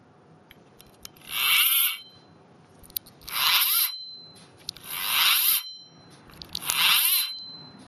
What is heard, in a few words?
back, Reel